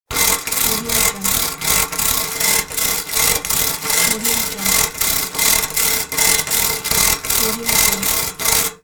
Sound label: wire brush scraping metal